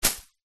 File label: plant fern broad leaved hit ground; foley